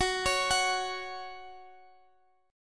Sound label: Gb 5th Gb down Guitar Half Step Down; Gb5 arpeggiated